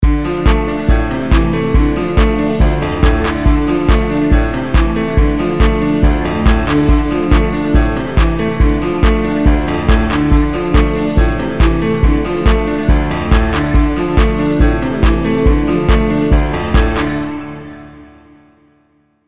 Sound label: Music